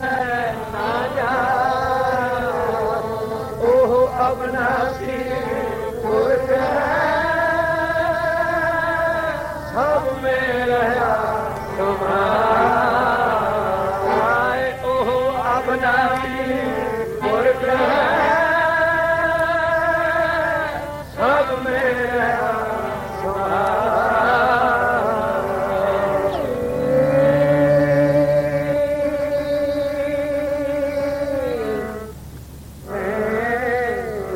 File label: Keertan; Bhinnee Rainarreeai Chaamakan Thaarae